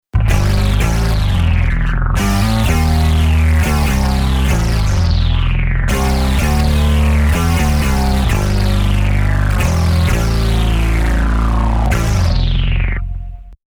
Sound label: synth